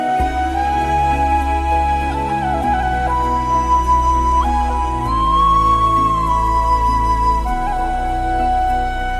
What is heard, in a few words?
real flute; titanic